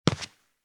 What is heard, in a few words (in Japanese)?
スリッパ 一歩; 足音の効果音